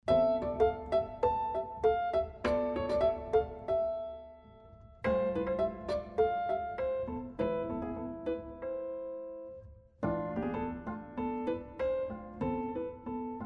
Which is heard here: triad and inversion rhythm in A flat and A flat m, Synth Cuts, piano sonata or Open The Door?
piano sonata